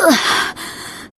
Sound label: Grunt; Lewd SFX